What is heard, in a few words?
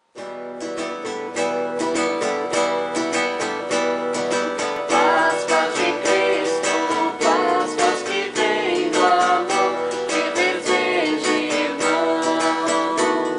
vocal